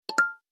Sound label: correct; quizlet